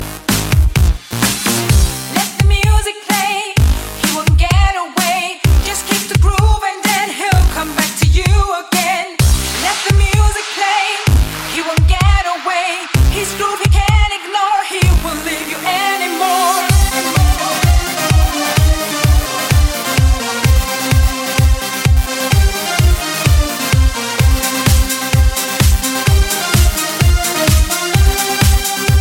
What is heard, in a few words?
Remix Music